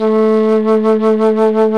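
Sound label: Alto Flute vibrato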